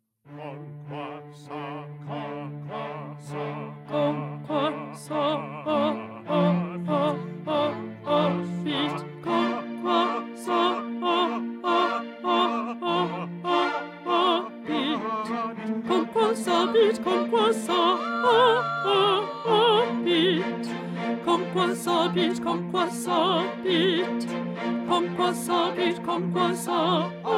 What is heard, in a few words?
Alto; Handel; Dixit Dominus